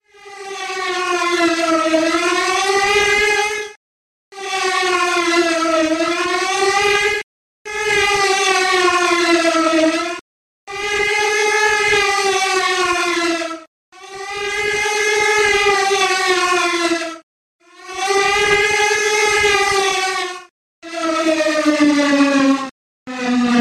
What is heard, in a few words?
iphone alarm